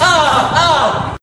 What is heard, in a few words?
proxy hurt; billy herrington; slender